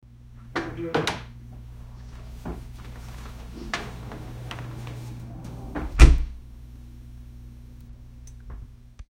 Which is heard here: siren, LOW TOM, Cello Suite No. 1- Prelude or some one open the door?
some one open the door